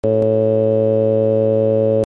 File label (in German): saegezahn 110